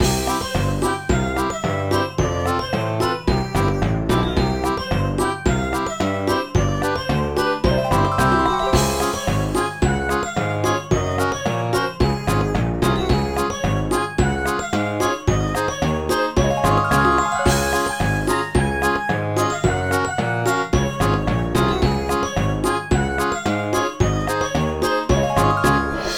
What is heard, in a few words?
Bgm circus event lobby